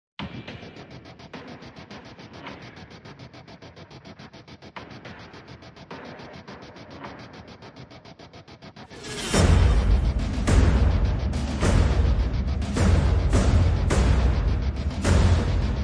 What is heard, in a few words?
Perc and Pulses; Brand X Music